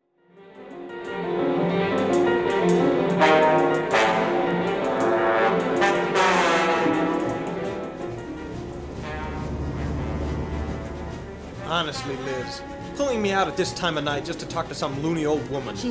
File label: Traffic crash